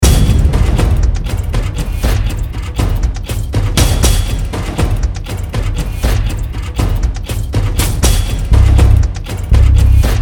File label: music game; soldiersfury